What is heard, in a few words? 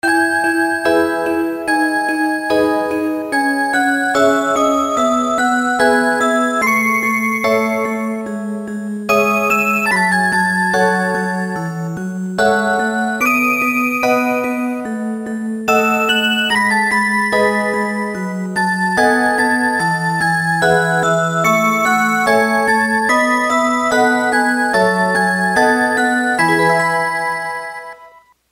rhythm song, clocks